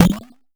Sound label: Blip, 8-Bit